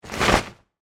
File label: Wings Flap, Bird; Animal; Birds; Sound Effects:Animals